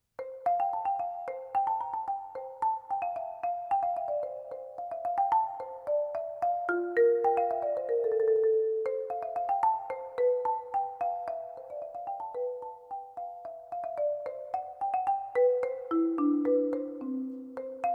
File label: keyboard